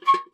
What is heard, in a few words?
creak; Kenney's Sound Pack; RPG Sounds